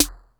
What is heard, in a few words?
RY30 SNR; Hollywood Edge; The Big Bad Beatmachine